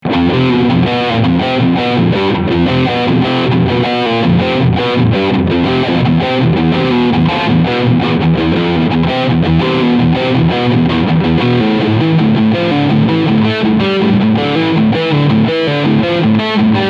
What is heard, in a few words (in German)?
Solar Guitars GC1.6FAB Riff Sound Steg Humbucker